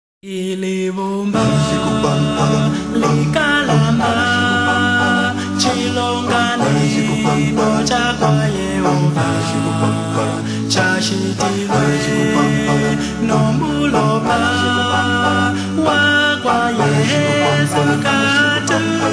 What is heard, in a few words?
Zambian Acapella; gospel music